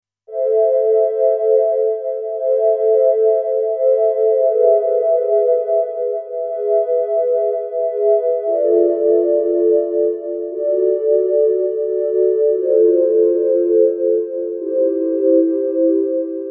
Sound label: inner Space sin Pad